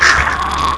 pain; baby

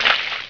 body exploding

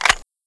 desert eagle mag in

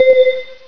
elebell

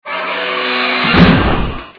crunch

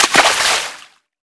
water small splash

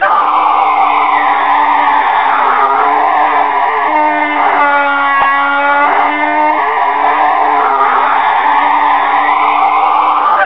sick

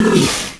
vomit, zombie

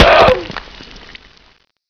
body splat alt

